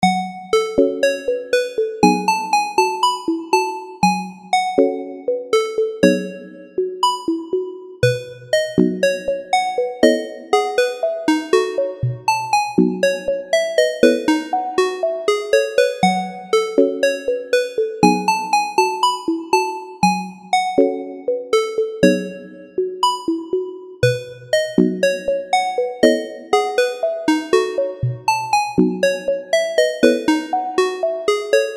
Classical Pop

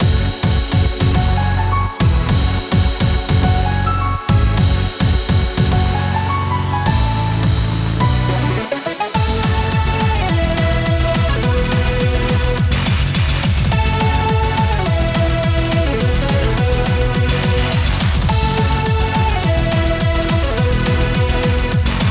hard boss battle, bpm210

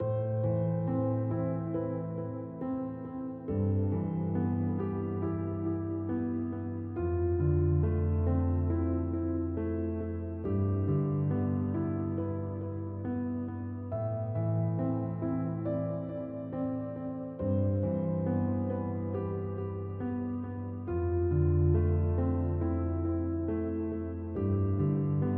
Piano Loop

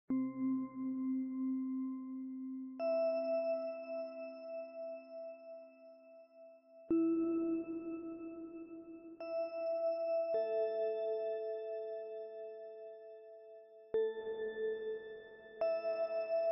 Ice Shine Bells

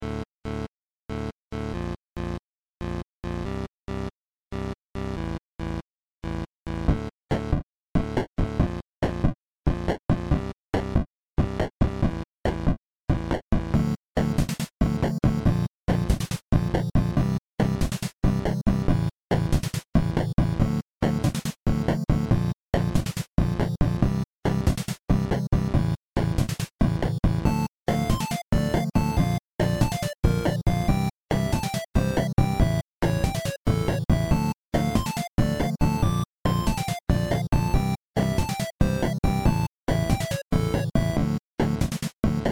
minigame

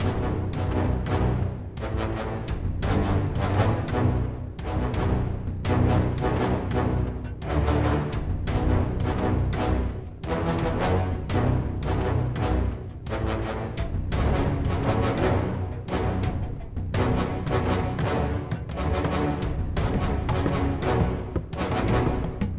Action, loop; Gregoire Lourme; Commando Team

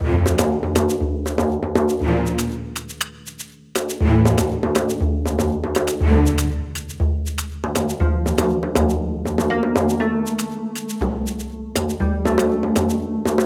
tactical thinking; Intense Percussions

